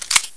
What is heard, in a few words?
9mm clip; svencoop; echoes